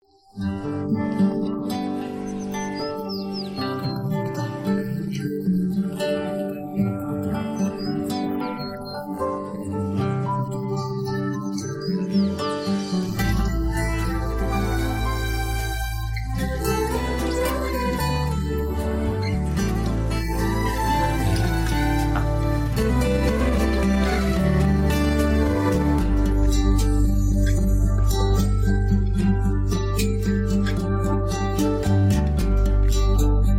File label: Latest Malayalam musical video Vocal Removed; BMPCC 6K